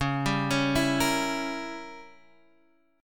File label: Db Diminished 7th Db down; arpeggiated